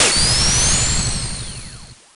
SFX - Release; donovanni the vanni ver .4.2 2021

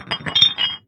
Gold Bars; The Town

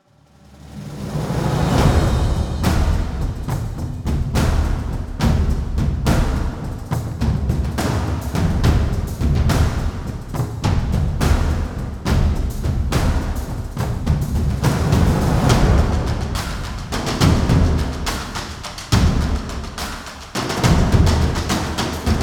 Chasing (Percussion)

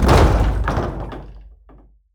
rampart gate stop

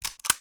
Loading Gun Inventory; Rebel Cops

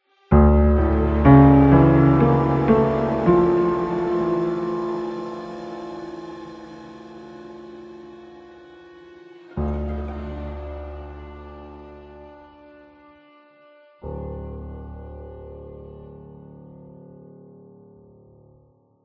Short Music Mystery Piano; Solus Project

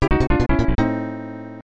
Tada; Heisei Shin Onigashima; snes

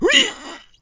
Pig Freeze; Angry Birds Space

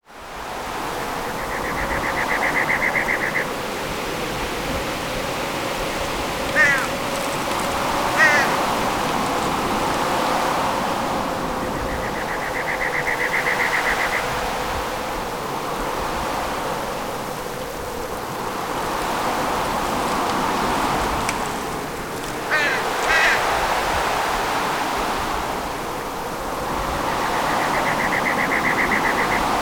ambience - Town; fate 2 undiscovered realms; Typhon

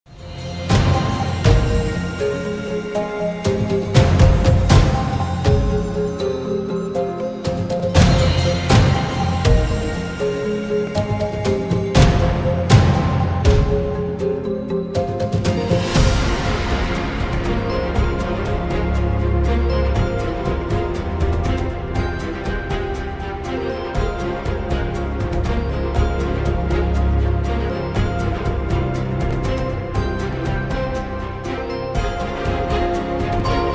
Beach Battle Music; ARK Survival Evolved

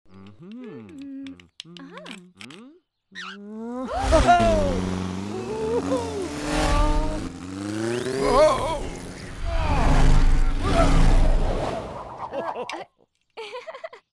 MotorBike Intro

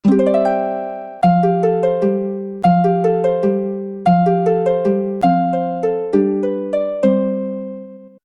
Lyre; RuneScape 3

